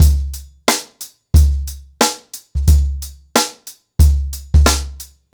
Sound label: Ripple Effect 90BPM, acoustic drums